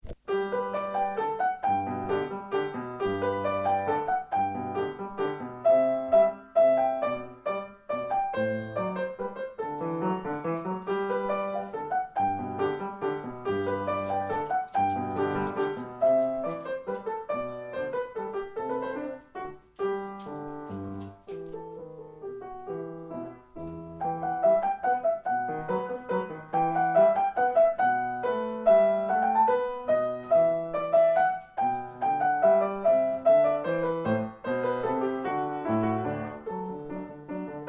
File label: Minuet in G